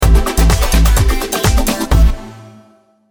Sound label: Cheerful, stingers; Monkey